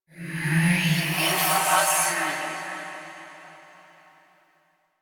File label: Duviri Hand Compass Vocal; Warframe